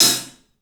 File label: HAT, CLUBHAT, 3D Drums; 3D Sound